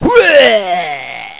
uuaargh

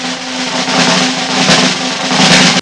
SNARE RO

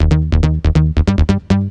bazzz; Techno Music Maker; Bass

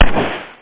player Bullet; F.A.R.M. Patrol

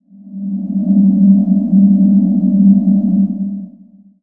GAS; EARTH

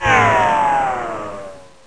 zoom out; Total Chaos Nukem 3d Pack; duke

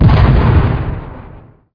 expl med; Deep Fighter

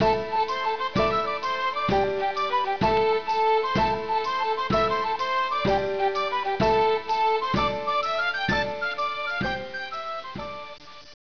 JIGLITE

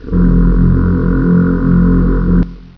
Voices; Sound Library 2000; Wayzata Technology

